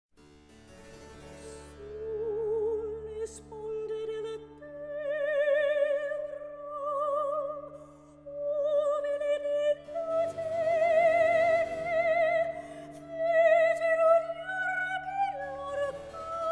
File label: Kantata. Recitativo; A. Scarlatti